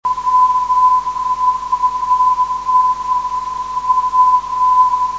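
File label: 842 kHz, non-directional beacon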